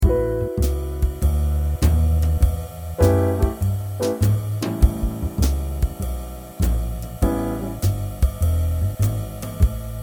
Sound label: backing tracks in a7